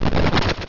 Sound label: Grass Knot; pokerogue; battle anims